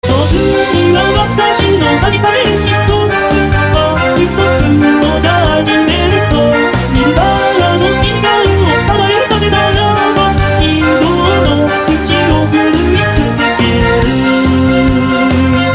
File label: Judgement Chorus